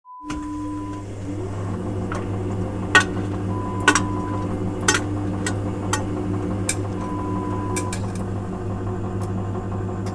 Tumble Dryer sound

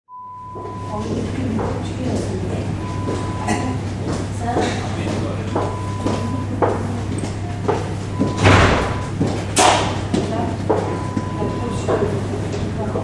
Hospital Sound effect

